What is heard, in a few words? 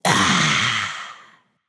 breath, voicepack; smite; jorm